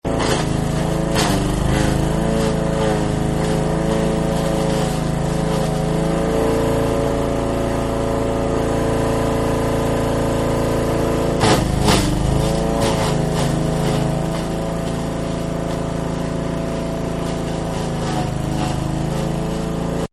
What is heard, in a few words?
Lawnmower Wood Chipper; Lawn Mowers; Machines